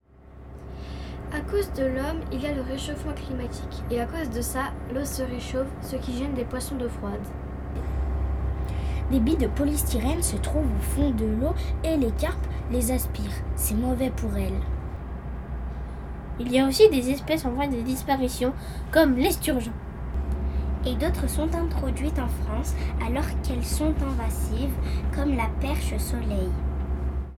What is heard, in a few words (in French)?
impact poissons; peniche